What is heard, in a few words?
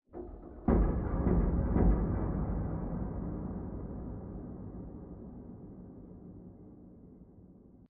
Metal Stress; Boomtown; Sideways Town; Titanfall 2